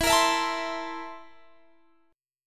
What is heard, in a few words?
C 13th E